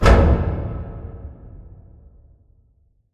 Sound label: giant metal door close start; Titanfall 2